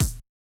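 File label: Bass Drum; Yamaha DJX-2B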